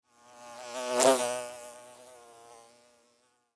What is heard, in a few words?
Fly Pass By Close, Animal, Fly, Pass, By, Close, Buzz, Wings, Insect, Bug; Hornet; Blast Wave